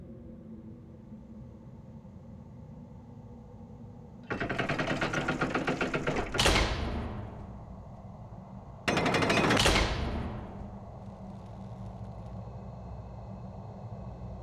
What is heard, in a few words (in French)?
Herse Fermeture et Ouverture